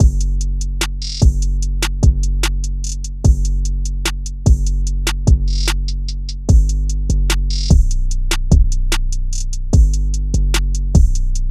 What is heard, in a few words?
Drum Loop 148 BPM